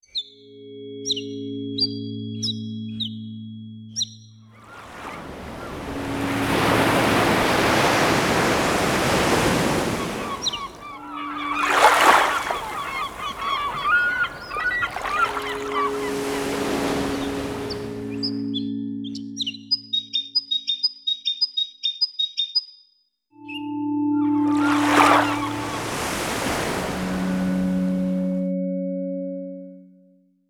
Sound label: nature